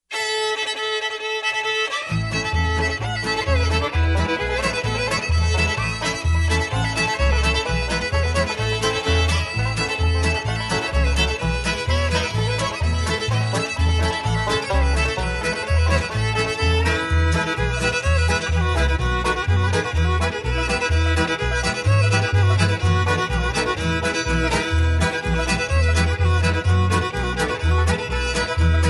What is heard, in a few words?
big fiddle hoedown extended, square dance